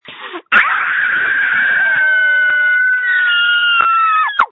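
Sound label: Scream, phone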